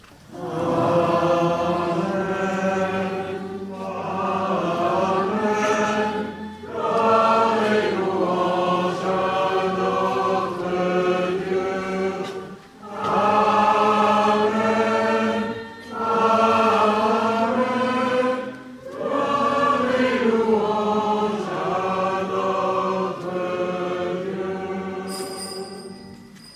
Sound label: amen amen